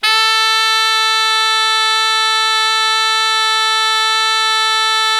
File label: tenor, solo tenor, horns; Zero-G; Phantom Horns